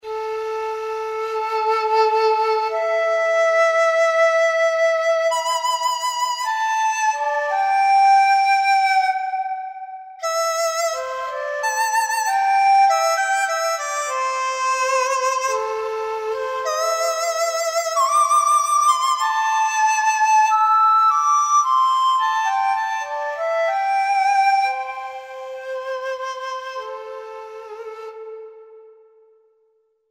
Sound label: BAN-DI - Chinese Flute; FLUTES; Spectrasonics Heart Of Asia